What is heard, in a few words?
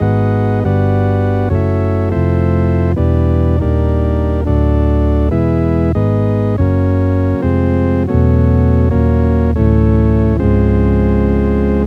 synth fuzz; cognitive